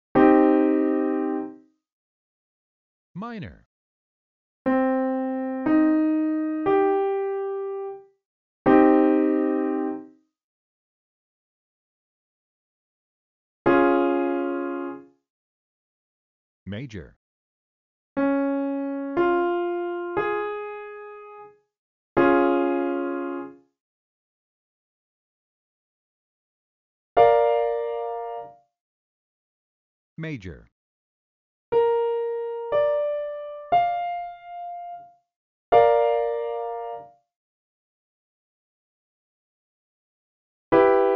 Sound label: Major and Minor triads (root)